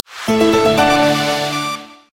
Notification normal; Notificaciones

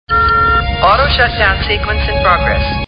Auto Shutdown

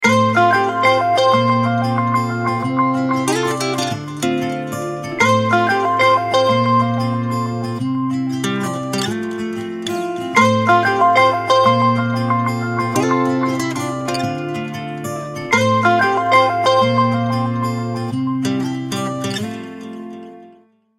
Lifes Good Alarm, alarmas ringtones

